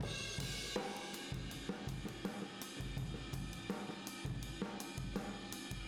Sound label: Boogaloo Chorus Room M, 82 BPM